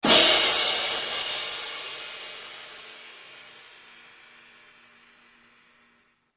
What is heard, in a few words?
cymbals; NH Philharmonic Orchestra